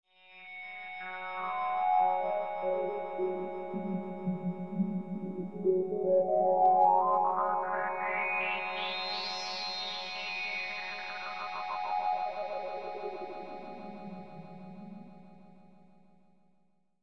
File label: drone flutters; jelly